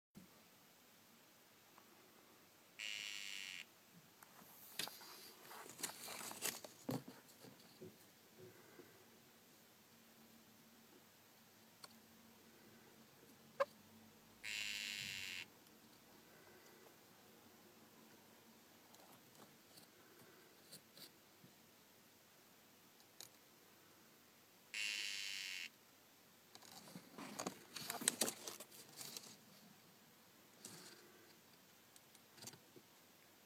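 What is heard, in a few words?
Dendrobates tinctorius Regina calling, frog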